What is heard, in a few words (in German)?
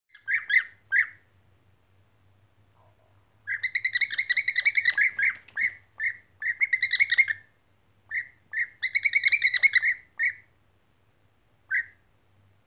Bienenfresser; Freising FS; Elsa Loessner